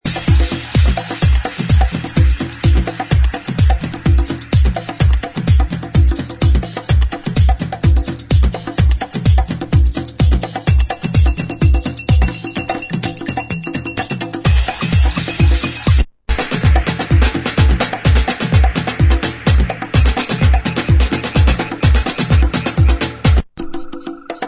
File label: Tanque Beats